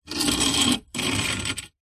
household chair outside metal tube frame scrape concrete; zapsplat